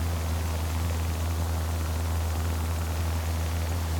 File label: Teleport Static On